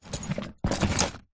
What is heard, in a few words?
horse saddle leather metal set down jangle